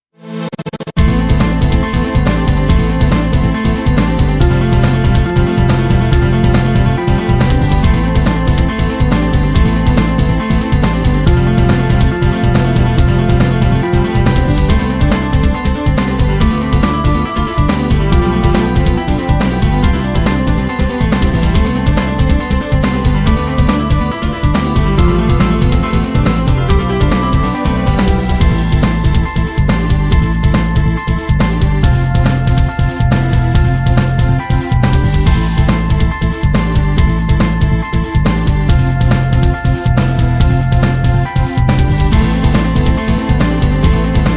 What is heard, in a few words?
hard beat rain